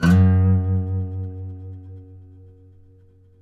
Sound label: F#, guitars